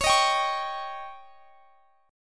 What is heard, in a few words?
C sharp Suspended 2nd Flat 5th C sharp; C#sus2b5; strummed; x,x,23,20,20,21